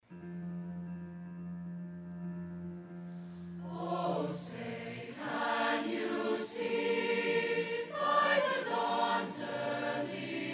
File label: National Chorus National Anthem